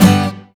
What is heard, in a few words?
Guitar Stroke; Roland BK-5